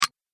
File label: Impact Hit Click; Miscellaneous Impact Sound Effects